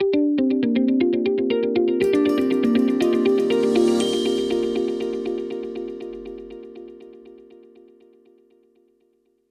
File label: Corporate Transition